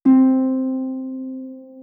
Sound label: c harp